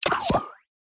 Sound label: judging; humanitycards